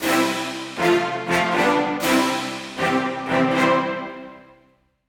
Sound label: Stinger